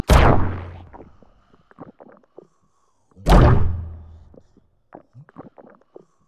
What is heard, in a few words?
emote joke loop; LoL; zac base